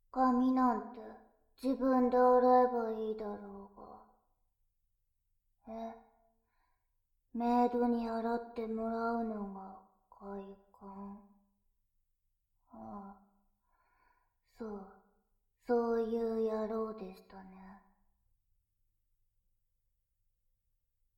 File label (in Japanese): シャンプー 反響; メイドさんのシャンプー・反響Ver